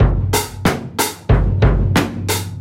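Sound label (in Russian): простой барабанный цикл который я сделал с помощью моей ударной установки Eastar EDS 480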